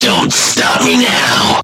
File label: game over